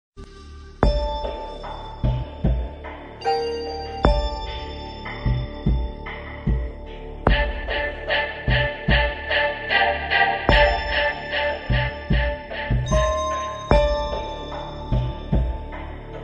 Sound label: string; prison break